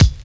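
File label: scratchy kick; Drums In Your FACE!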